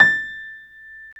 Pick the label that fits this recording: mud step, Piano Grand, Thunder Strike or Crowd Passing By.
Piano Grand